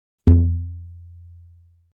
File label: Cartoon elastic pluck wobble; boing